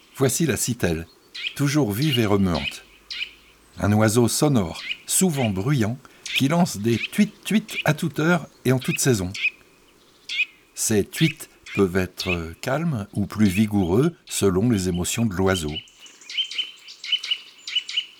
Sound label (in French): La Sitelle torchepot; chants d'oiseaux